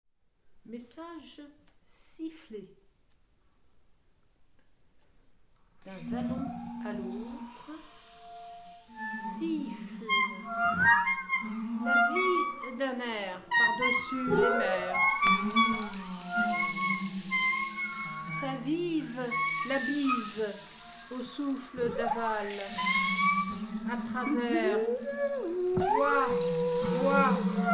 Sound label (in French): Message Siffle